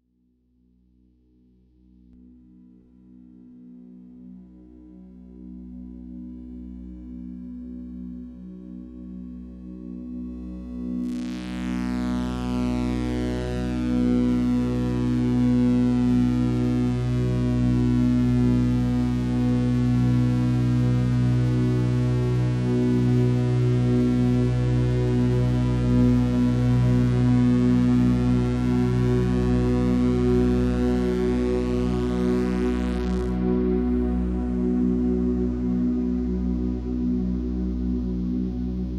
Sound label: meditation drone